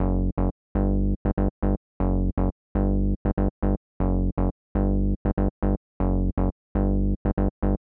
Bass, Happy People 120bpm D, Nu Disco; Zero-G

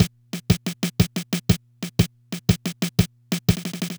Loop, dd-5

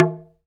TALK DRM, Heart of Africa; Spectrasonics

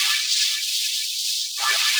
Tape Arp; Sonic Foundry (Sony Creative Software); Ma Ja Le Ethereal Textures; Noise Percussion

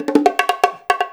FILL, Percussion

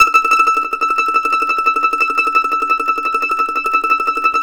MANDO TREM E6, Whole Lotta Country; Sampleheads; Whole Lotta Country 1 & 2

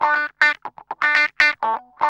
GUI, LICKS; 120 LICKS

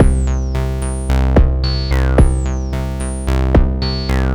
MODU C, Modular Synth Loops; Polyend; Simulacrum Sounds

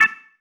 Stab, Organ, One Shots

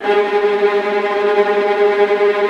VIOLINT A#, Strings; Synclavier; ILIO

